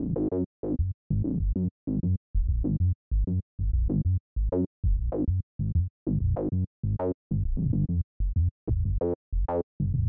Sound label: loop bass thunder